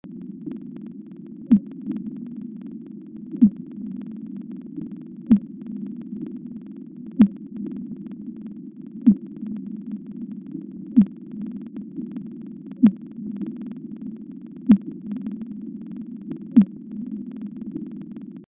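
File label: atlantic fin, seasounds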